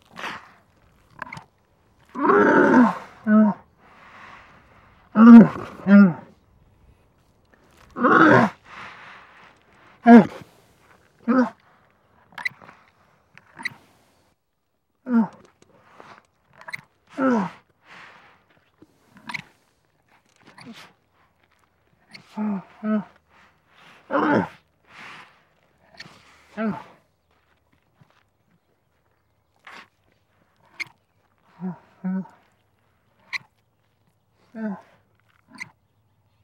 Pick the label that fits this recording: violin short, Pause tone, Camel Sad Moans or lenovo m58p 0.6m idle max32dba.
Camel Sad Moans